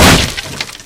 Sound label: wood plank break; Source Engine; video game; physics